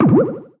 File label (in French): bombe posee; bomberman